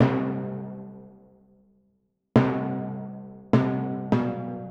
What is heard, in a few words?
Timpani, 102 Cmin; Hip Hop